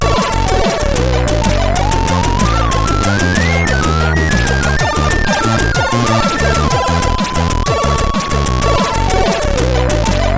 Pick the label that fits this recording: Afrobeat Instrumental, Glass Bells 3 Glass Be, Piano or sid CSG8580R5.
sid CSG8580R5